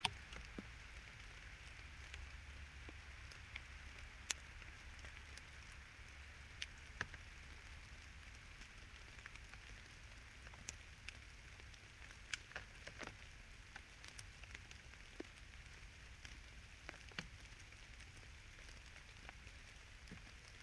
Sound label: yamanakako sound